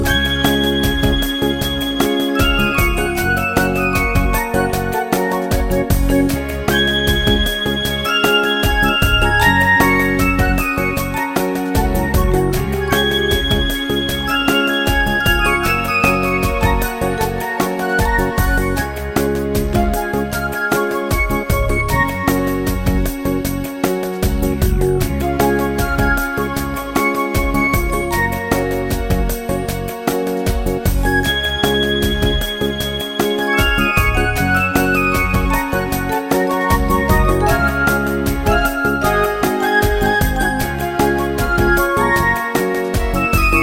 Kool Beat

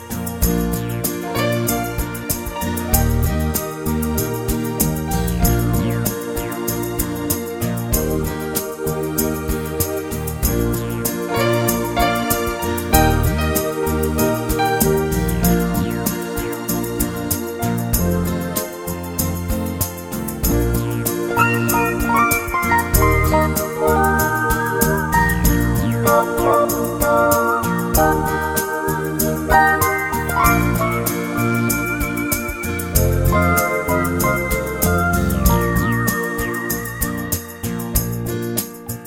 Unplugged Guitar

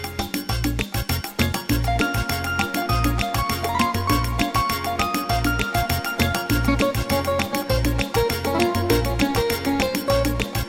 Guitar Samba; beauty

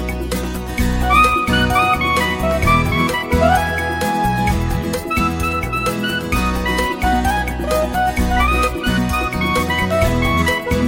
Acoustic Rock

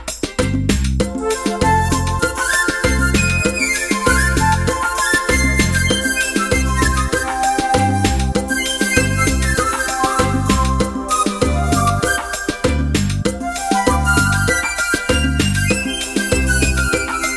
Reggaeton

